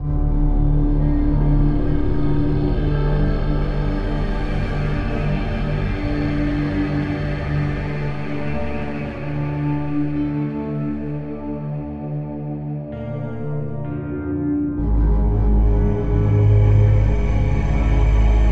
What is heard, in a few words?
hideout door, music